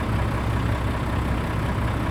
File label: TRUCK Idle loop; Crop